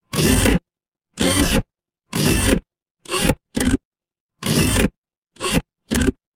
mechanical lever

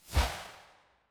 transition swoosh

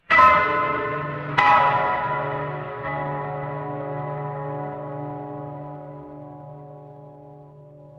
horror stinger

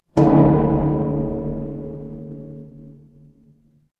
instrument gong

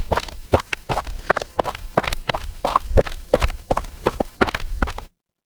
footsteps gravel

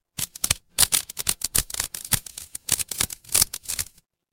fire electric crackle

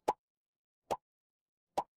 cartoon pop

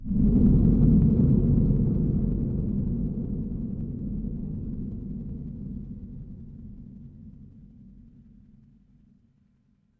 horror ambience dark

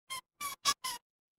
cartoon squeak

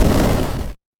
retro game explosion

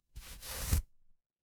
paper fabric fabric

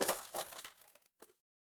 impact crash

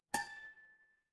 glass clink

